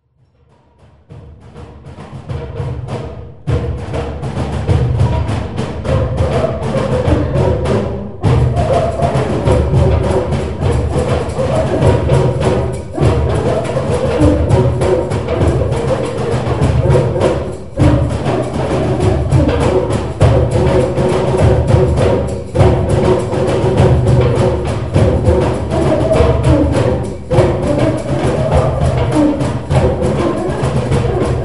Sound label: Cajon Orientale